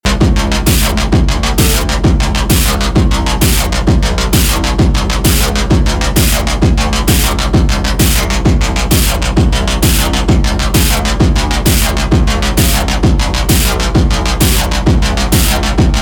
pendulum snippet, industrial techno

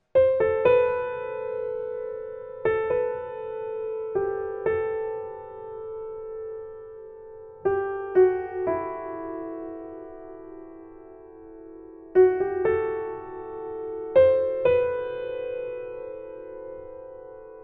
keys sustain midi seq, Piano

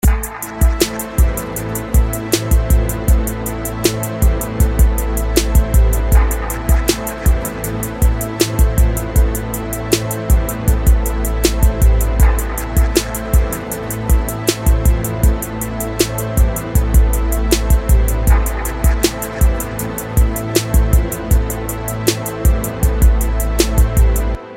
mixed loop

